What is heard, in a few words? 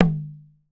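INDIAN MIRD, ETHNIC SET; Voice Crystal - Steve Reid Definitive Percussion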